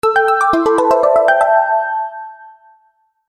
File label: Ringing; Oppo; T703